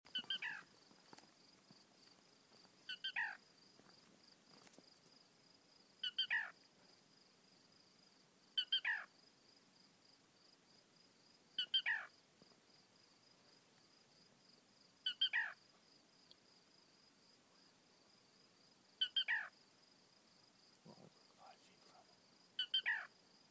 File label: Black Rail; WV